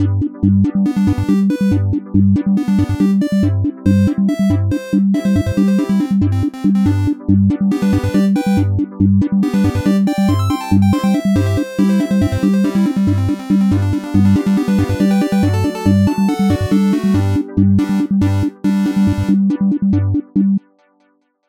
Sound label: happy little elevator song